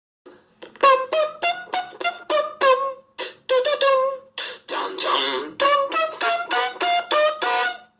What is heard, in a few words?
tuntuntuntuntun